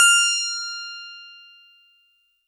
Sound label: Easy Clav DX100 F7